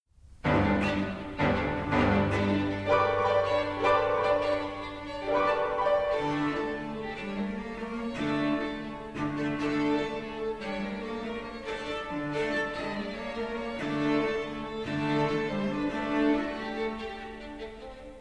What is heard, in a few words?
Piano Trio Allegro assai; Piano Trio III